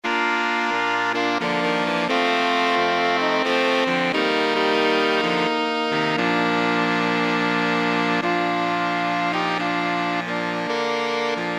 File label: Jazz Sax, saxophone quartet; Silent Night